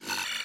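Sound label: glitch sfx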